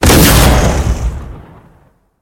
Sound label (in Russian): moshnij vzriv v okope